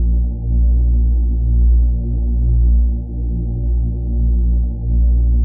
hum; hexagon glitch 3

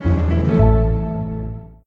gameover; pipe mania

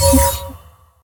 respawn; ball rolling game

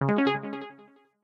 win; line climber

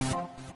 kill; geometry tower

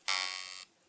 boing; spring ninja

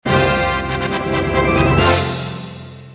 win; tower defense

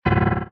fail; magic easter tiles

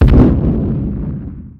explosion; air wolves

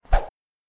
throw; street ball jam

